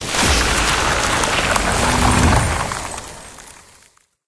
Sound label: ice; smite; heimdallr